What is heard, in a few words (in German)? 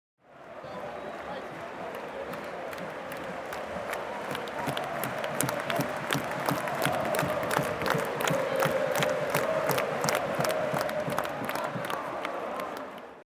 Stadion Fan und klatschen